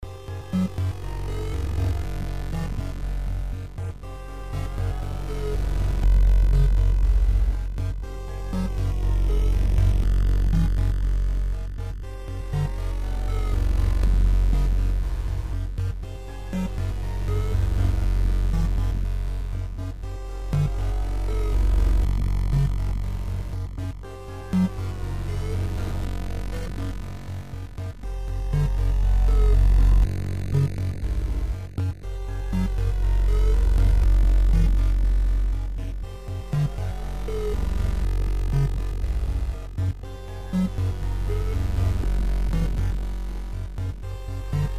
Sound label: suspense